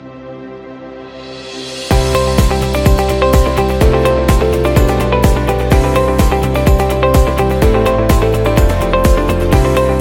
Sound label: Corporate Upbeat